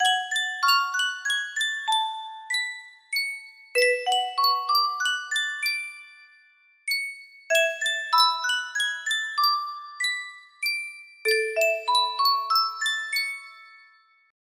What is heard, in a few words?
sankyo music box